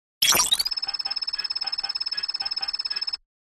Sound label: Calculations